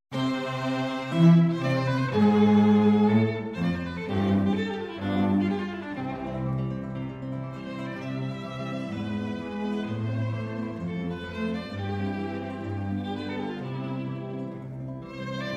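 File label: Quartet in C major, Allegro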